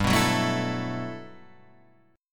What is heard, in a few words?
G Major 13th; strummed; JGuitar